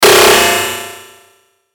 Hit High Metal, Hit High Metallic Digital Distortion; Blastwave